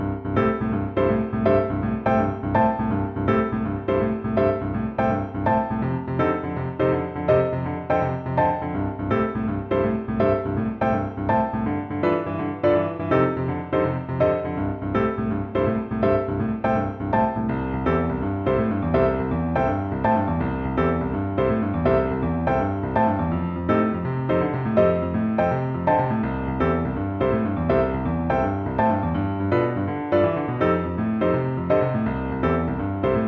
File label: Exercise in Ascending Chord Inversions